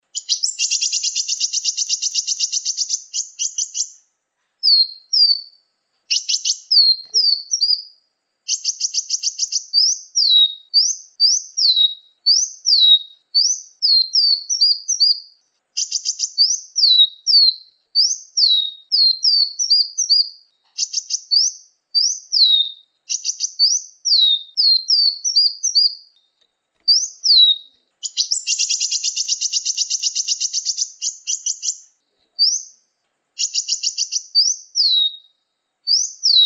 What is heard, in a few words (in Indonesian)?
kolibri muncang